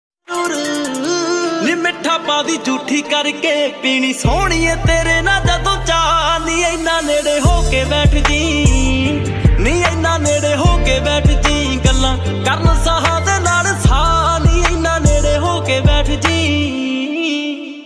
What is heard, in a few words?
punjabi sad song ringtone